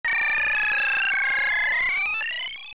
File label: Portal In; Stepping into and out of a warp portal